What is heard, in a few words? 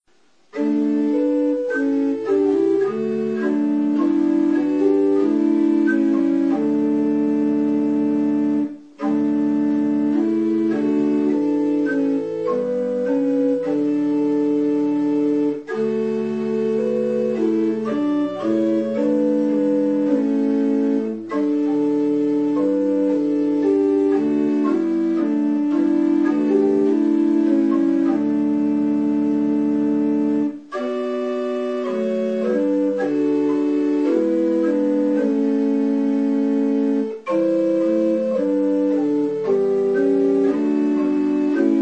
communion hymn Come Down O Love Divine